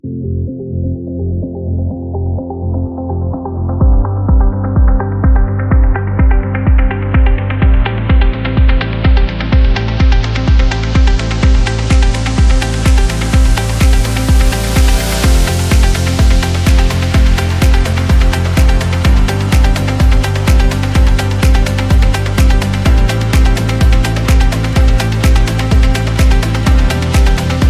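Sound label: School Project, motivational corporate music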